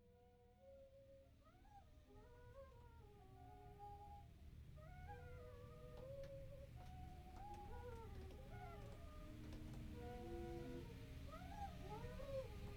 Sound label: song and birds and backward piano